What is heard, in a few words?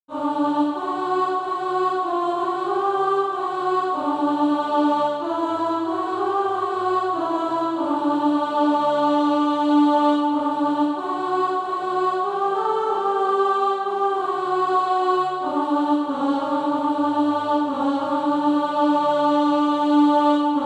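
alto; Faith in Jesus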